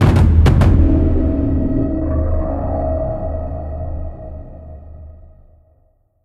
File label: Shrine Destruction; Devour; The Inn